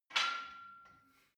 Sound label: barbell weight rattle soft, fitnessstudio